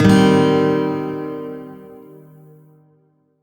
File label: Shawzin Pent Min Chord B; Music; Warframe; Lotus Shawzin